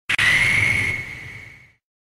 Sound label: homerun bat; potry v2